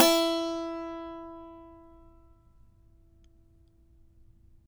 saz; Roland - LA Composer vol. 3

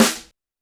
Snare, Roland TD-20

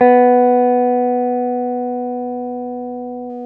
GUI SUS B 2, E-Guitar; E-GUITARS

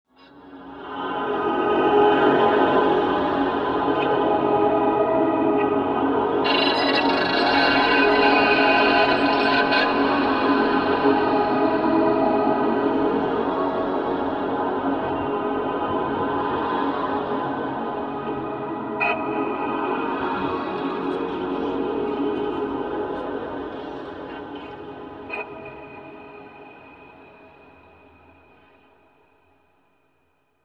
FX Ambient Hemisphere

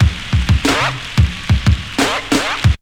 break; Zero G - Man Machine

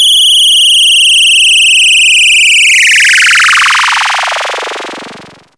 OSCILLATE, OSCILLATION; Zero-G Ambient 1

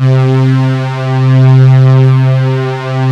FATTY STRNGS; Pro-Rec - Synthsonic; Z1 PADS

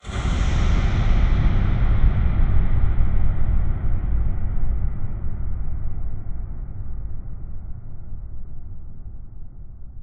Huge Verb Tail, Long Reverb Tails; impact samples

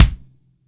MIX KICK, JAZZ

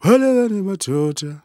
Vocal Chirp, African Voices

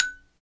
XYLO, Afro Xylophn; Roland LCDP11 Africa VOL-1; Bala & Xylo

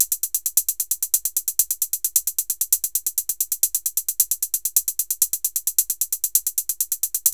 DRUM, 132; Megabass Remix! 2

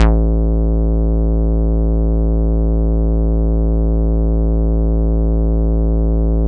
Synths BASS

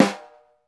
snare, Roland; LA Composer

